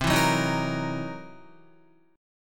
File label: C 7th Sharp 9th Flat 5th C Guitar; strummed; JGuitar